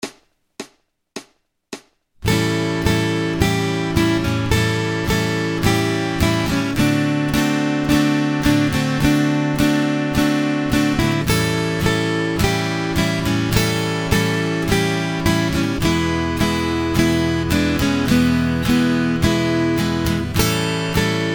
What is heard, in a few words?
Acoustic Accompaniment, acoustic guitar